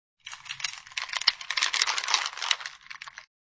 Ice Glass Swirl, Sound of ice being swirled in glass.; Drink Mixing; Bars